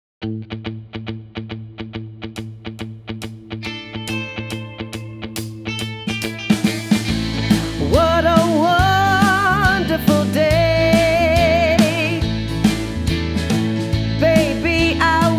Magic Song

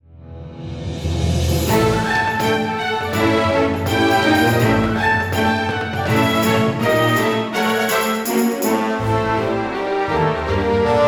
midi

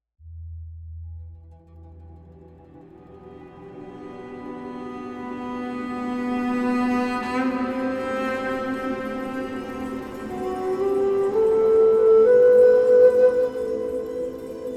Enthusiastic

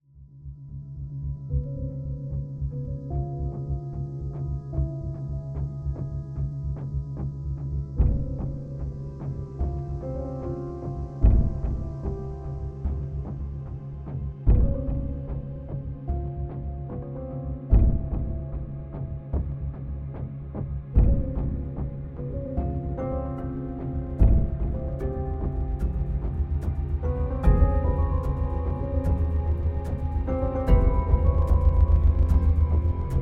End Credits with Melody

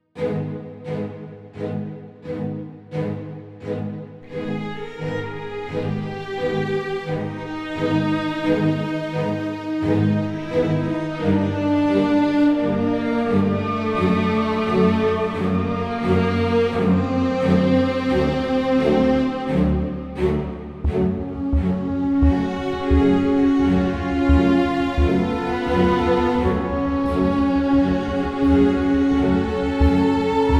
Dark Magic Waltz Tension Twisted

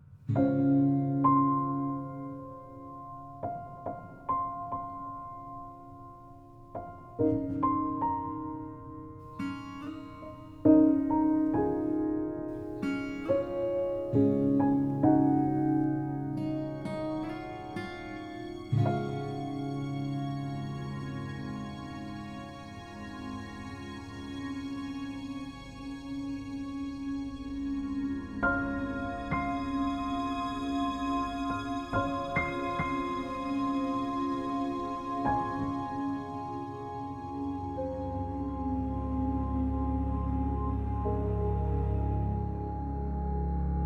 Delicate A little Sad to Hopeful Rhythmic Ukulele

